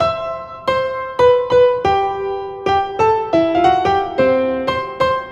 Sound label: Happy Piano